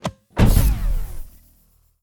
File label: Autobots footstep, Transformers; Bluezone